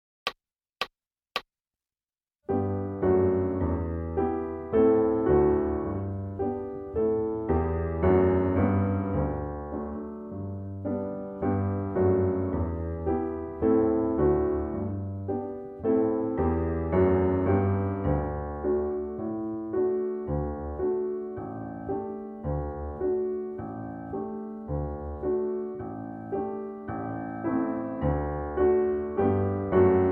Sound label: Piano; The World Turned Upside Down